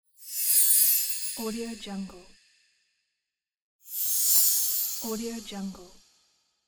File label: small chimes; transitions and movement